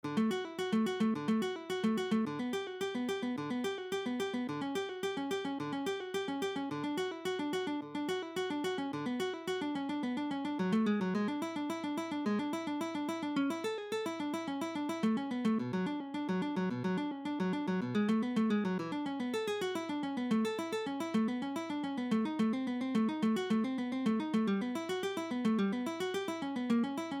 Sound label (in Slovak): Preludium in D Major, gitarove materialy; J.S.Bach